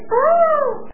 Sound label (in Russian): krik popugaya v dikoj prirode